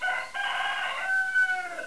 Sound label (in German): hahn; de scheiterhaufen2